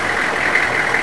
diesel, ambience; quake; fortress

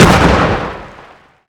pop; quake; fortress

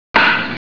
ball hit; quakerepo fortress misc

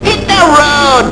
hit road; quakerepo fortress misc

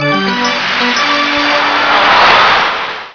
charge; quakerepo fortress misc

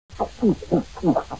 gulp; quakerepo fortress misc

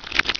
gib pick; quake; fortress; gibs

